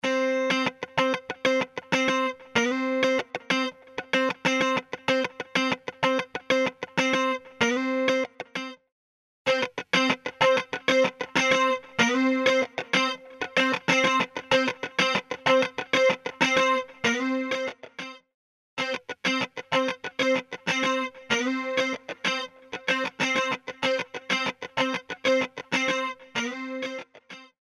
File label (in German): Doubler trocken